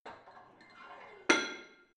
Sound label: barbell plate put on hit damped soft, fitnessstudio; sport freizeit